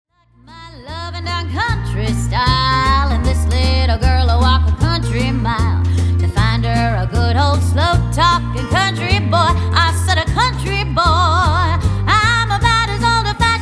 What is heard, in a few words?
Grand Ol Laundry Medley